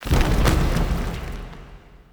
Shrine Temple Door Close

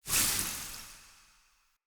blood lust leaf burst; Powers; Fey; Champion

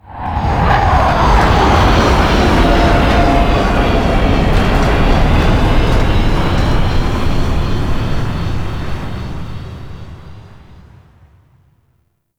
Ship Drop Off; Vehicles; Warframe; Liset; Liset Flight; Grineer Ship Liset

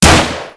Shot; Warframe; Weapons; Sicarus

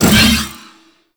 Big Slash Whoosh

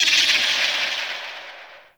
Corrupted Beach Seagull Day; Ambience

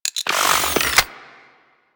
Capsule Return; Ambience; Warframe; Clan Dojo

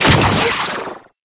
Heat Gun Fire; Warframe; Grineer

